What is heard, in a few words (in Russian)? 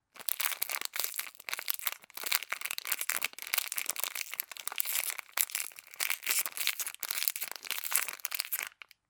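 звук шелеста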